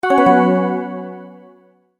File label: Multimedia Tone-Return/Back